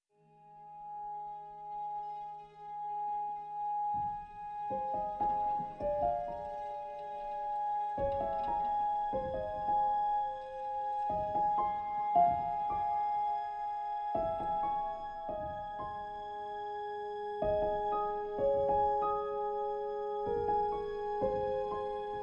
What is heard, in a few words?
Gentle wind; 「your blanket」